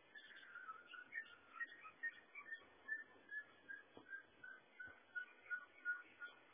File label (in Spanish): Chuna de Patas Rojas